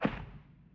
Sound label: Footstep